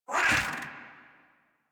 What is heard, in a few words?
Catbrow Combat Vocal Attack Short; Warframe